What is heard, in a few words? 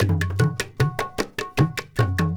INDIA L, Heart of Asia, IND ENS; Spectrasonics - Heart of Asia CD2